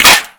impact metal; weapons; Modern Warfare

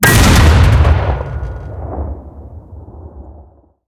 cannon 122mm shot, cannons tanks

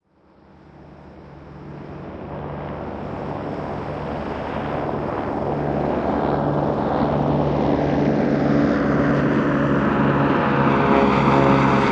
combat atmosphere; World of Tanks

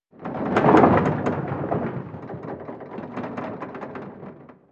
ac130 rattles

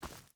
footstep hide forest walk; Rust

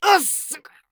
hit; Escape from Tarkov; scav3

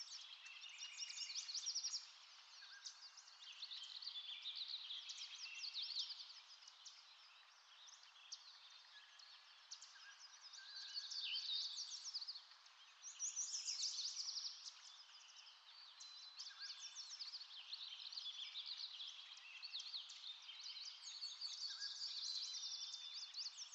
ambience birds chorus; Rust

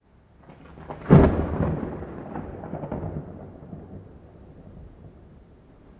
thunder med; Rust

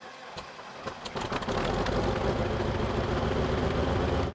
start engine, engines; World of Tanks

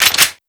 glock reload first chamber

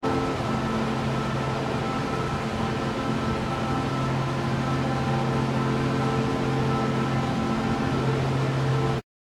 ship engines; World Of Warships

